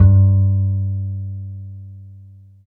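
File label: bass G1; East West - Phat & Phunky CD2; 90's Sample CDs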